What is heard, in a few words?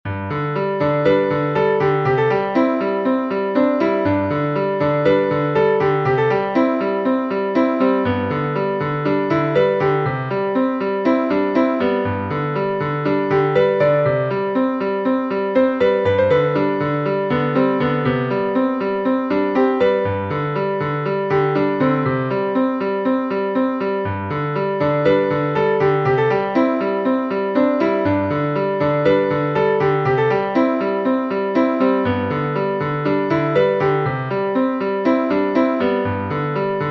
Bouncy song